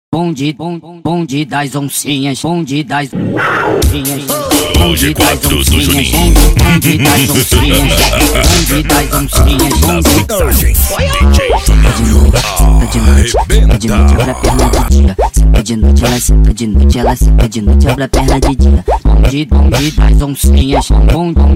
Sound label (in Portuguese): cd funk automotivo